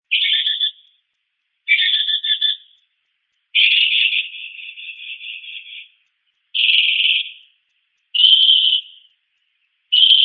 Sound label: Bird Noises; Bird Noises 2; Birds (Sound Effects:Animals)